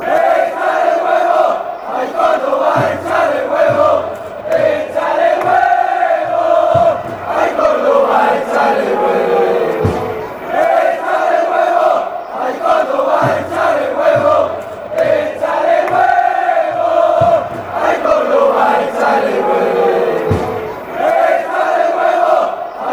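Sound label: break, football songs and soccer chants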